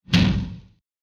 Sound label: warfare mortar projectile launch; zapsplat